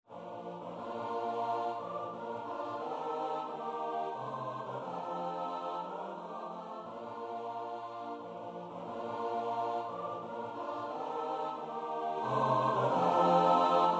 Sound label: midi; niby echo spoza swiata